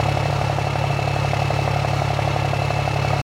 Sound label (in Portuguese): Ronco Motor Vw Titan